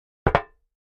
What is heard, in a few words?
Aluminum Pan Buckle; barrels buckets & cans